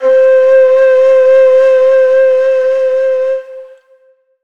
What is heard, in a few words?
Flute 4 - C; 99Sounds; Monosounds; Music Loops; Instrument Oneshots